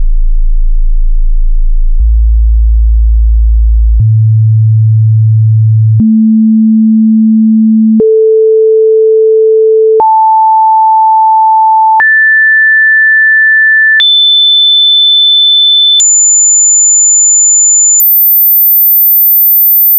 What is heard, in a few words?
Sine Wave Octaves